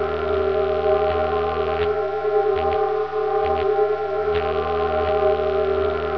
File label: forcefield; de abducted